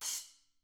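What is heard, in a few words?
splash cymbal; ILIO; Double Platinum Drums 1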